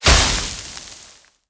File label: Sand Blow; blue archive